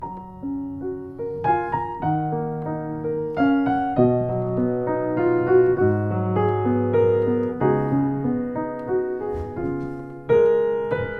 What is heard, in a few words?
Ibach model 180, piano